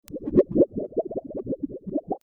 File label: Under Water Sound; FXHome